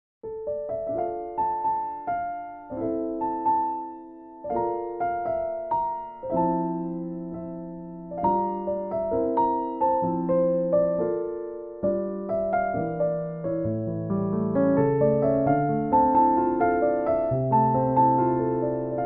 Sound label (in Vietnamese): Nhac Chuong Piano Khong Loi